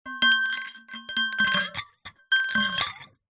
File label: household empty metal cocktail shaker bash against drinking glass; zapsplat